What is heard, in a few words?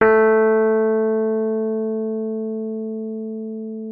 Rhodes A3; Xsample VOL-1; 90 s Sample CDs